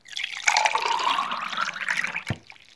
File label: pour; blues pharm